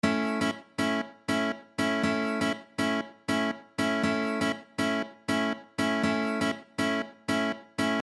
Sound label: Rhythm, guitar